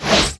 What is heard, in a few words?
fast hit; RYL 2